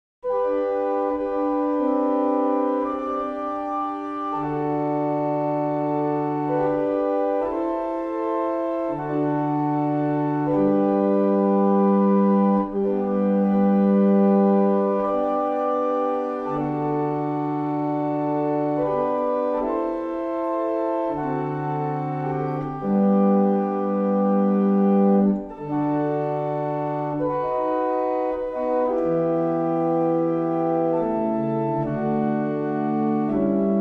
German Hymn, barrel organ; Faulkbourne

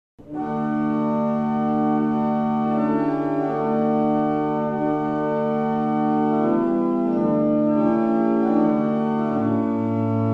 Double chant, barrel organs; Avington; Randall

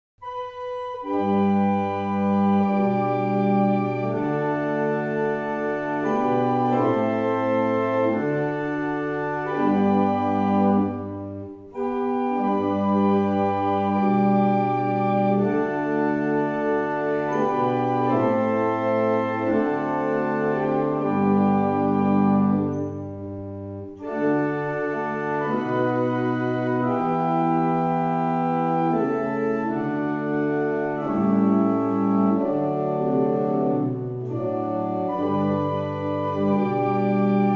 German Hymn, barrel organ; Stratfield

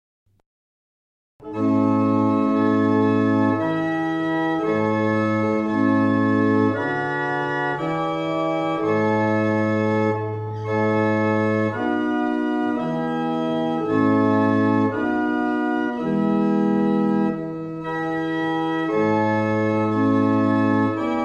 St James, barrel organs; Mattishall

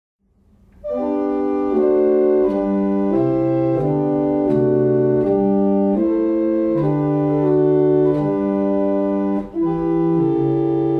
barrel organ; Lillington

